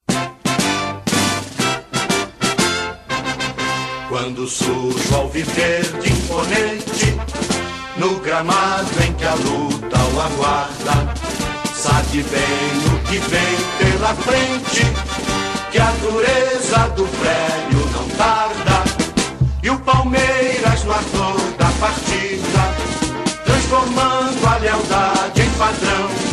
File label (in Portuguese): hino; Palmeiras